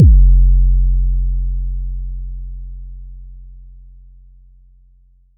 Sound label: sweeper; Heartbeats